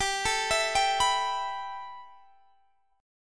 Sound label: B 7th Suspended 4th Sharp 5th G